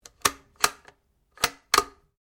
RIFLE ANSCHUTZ 67MSR 22 CALIBRE BOLT ACTION SEQUENCE; A selection of domesttic firearms; Rifles